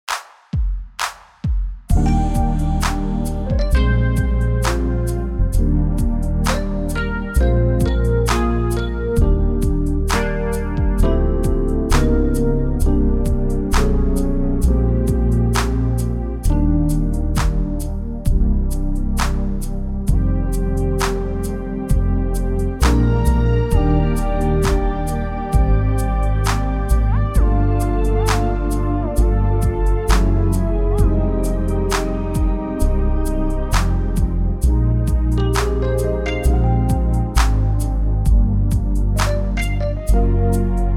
Eb smooth

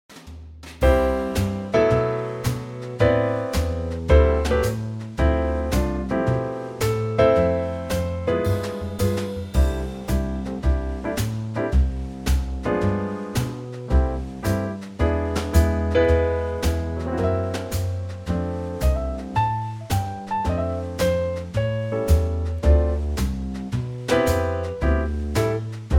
trio C

